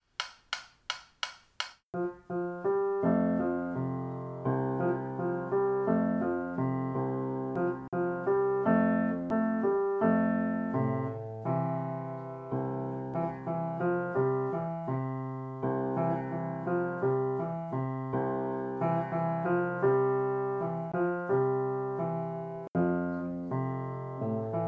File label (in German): playalong, piano solo; klavier mit tier 2; pianodidact